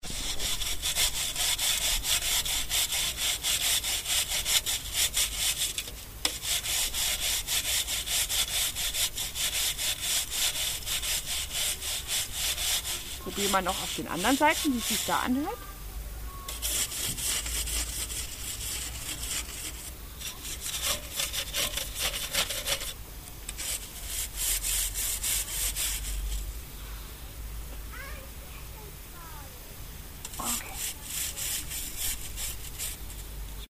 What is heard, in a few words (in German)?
schmiergeln